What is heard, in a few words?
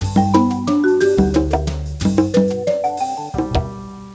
vibes; PUPPIES